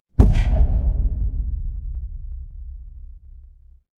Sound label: magic scifi shield